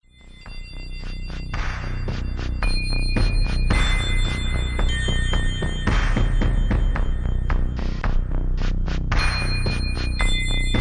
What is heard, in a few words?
SPACE